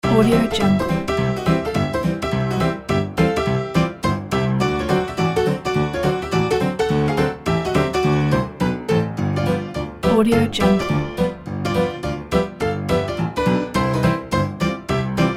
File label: honky piano loop